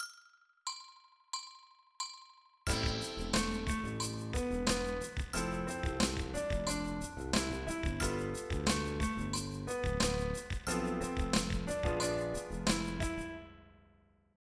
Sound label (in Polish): Z podkładem; RYTMICZNE TENSION; IMPROWIZACJA